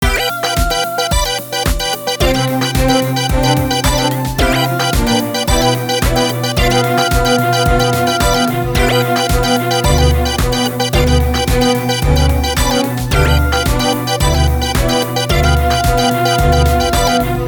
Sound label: GarageBand Midi; 學生作品